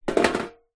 household tin metal empty drop down on wood; zapsplat

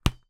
sport football kick or punch

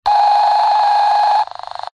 technology walkie talkie radio interference

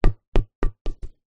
beach soccer ball down on ground bounce to stop; sport

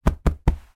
foley 3x hand pats on leather sofa arm rest

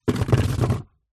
household chair patio plastic drag wooden decking

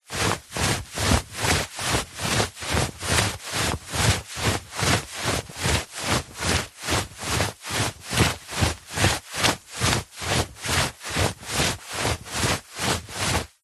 foley clothing movement shorts baggy walking fast

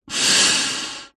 human blowing air into plastic tube

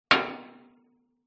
impacts metal drum thin light resonate

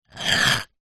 wood pole scrape hard ground; foley; zapsplat

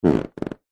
household bed mattress sit on creak; zapsplat

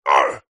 human fight karate vocalisation male

